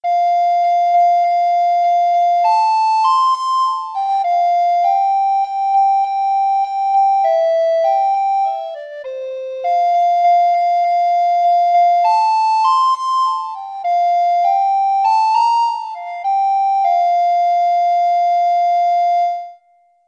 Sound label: recorder; 10 little indians